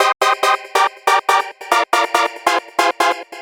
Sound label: Glider Flicker